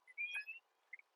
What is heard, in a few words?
Chirp